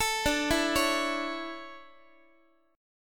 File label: D Major 7th Suspended 2nd, Guitar; arpeggiated; JGuitar